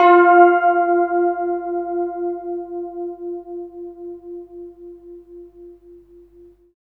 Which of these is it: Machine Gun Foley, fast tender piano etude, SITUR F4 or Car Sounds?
SITUR F4